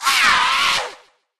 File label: hurt evil